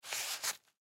Wallet Insert, Wallet Leather Insert Credit Card Into Slot; Rustling; Foley; SoundEffects-Blastwave